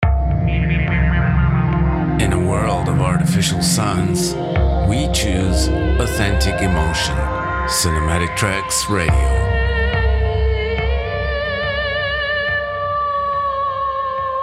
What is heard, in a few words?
sweeper